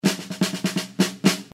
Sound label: Quick Snare